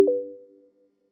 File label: soft beep